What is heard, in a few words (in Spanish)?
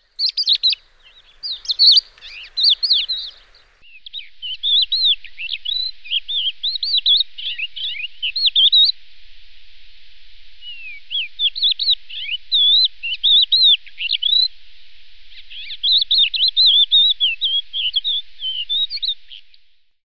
cogujada comun